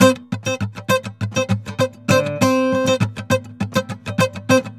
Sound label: GUITAR SAMBA